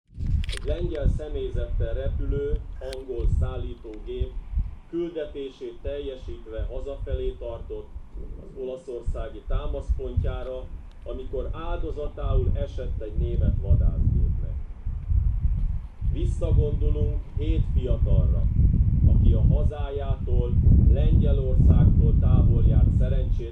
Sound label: digitar